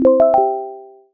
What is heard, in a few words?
New Mail; Emberfall